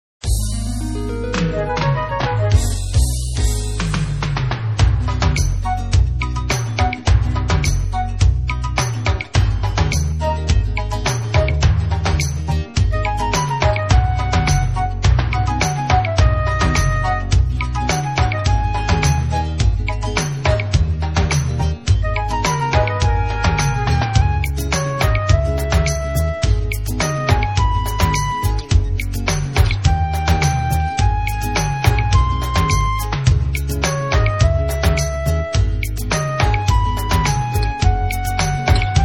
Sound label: karaoke